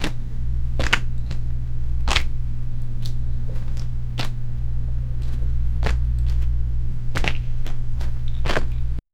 schritte

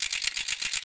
mechanisches rhythmisches, machine

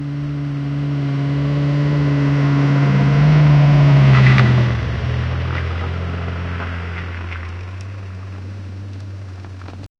lkw auf kiesboden ein schw, trucks

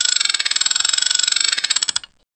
ein spiel sound einer slo, pirate

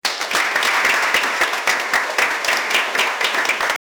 jubel und klatschen beim; celebration

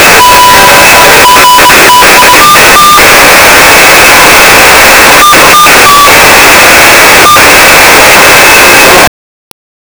kurzwellengehacktes beim, shortwave

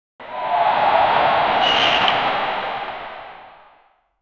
kurzer dynamischer sounde, hockey

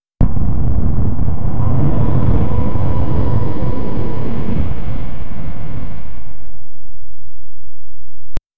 warp sprung aus dem inner, space

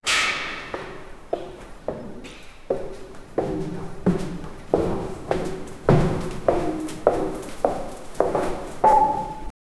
schritte einer jungen; prison

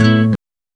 naturklnge mit akustische, acoustic guitar

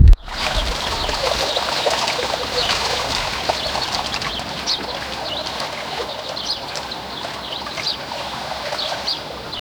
mittelalterliche dorfgeru

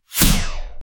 ein mystischer zauberstab, wizard

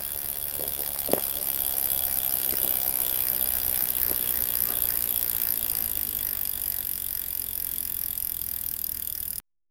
fahrendes fahrrad; bicycle

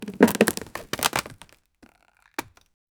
holzkiste die zerbricht; breaking

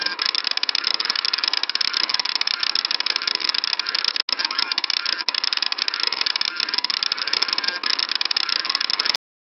slot maschine gewinn; slot machine

